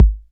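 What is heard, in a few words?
bass drum 808 Sat Mid Decay A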